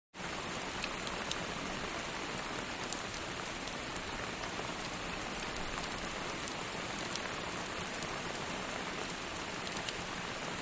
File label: Medium Heavy Rain On, ext